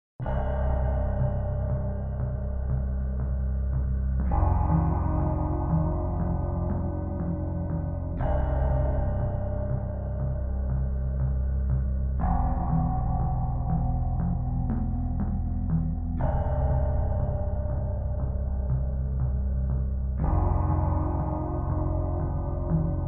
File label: dark cave, ニューエイジ